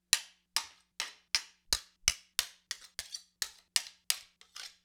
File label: Dagger Katana Blade on Blade, Weapon on Weapon; StillNorthMedia